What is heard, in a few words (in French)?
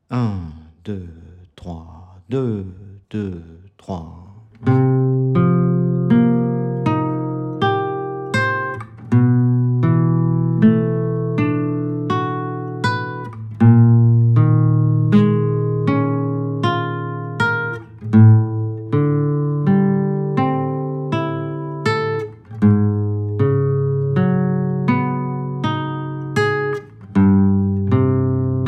exercice grand barre exercice a 080bpm